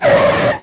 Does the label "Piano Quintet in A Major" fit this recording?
No